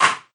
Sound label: menu up down; tetrisfest